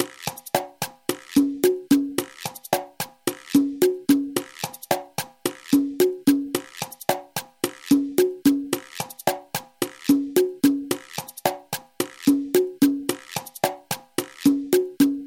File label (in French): bolero cubain